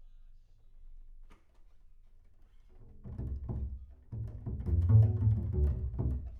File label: Bass Room